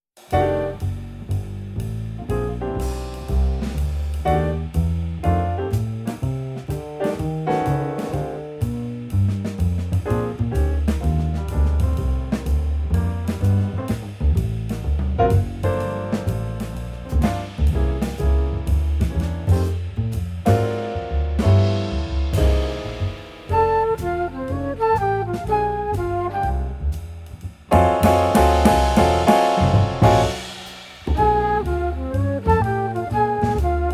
Bassi Blues

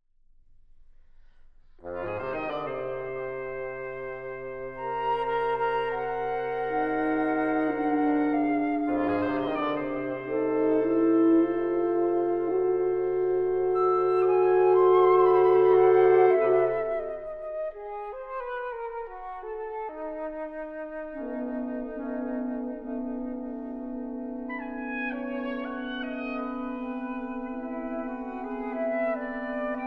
Suite Hermetia for Woodwind Quintet

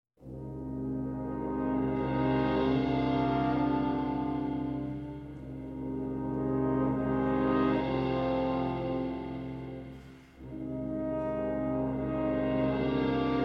Symphony In Brass; Mvt. 1. Andante-Allegro

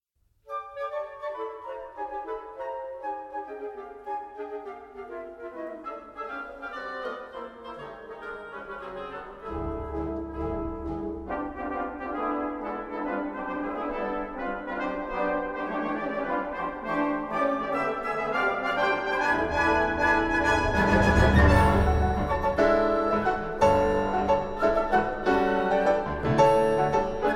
Piano Concerto, Dancelike

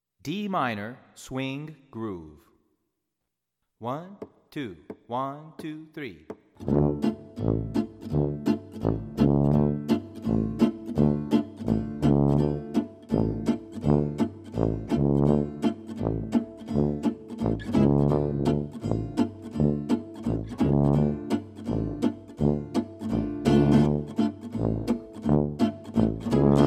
Dm Gypsy Swing Groove Dm D F A

